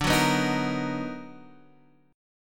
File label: B Major 7th D; Bmaj7/D strummed; JGuitar